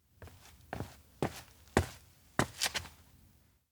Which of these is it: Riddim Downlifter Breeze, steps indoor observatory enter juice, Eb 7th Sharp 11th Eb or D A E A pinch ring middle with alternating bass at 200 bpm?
steps indoor observatory enter juice